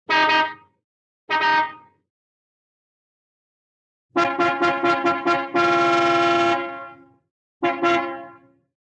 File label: Horn, Auto, Cartoon, Car Horn Several Times; Phone Losers of America Media Pack 2017